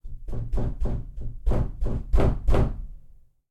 DOOR KNOCK Bathroom, Wood, Heavy, Fist, Medium, From INSIDE; Ghosts Return; Articulated Sounds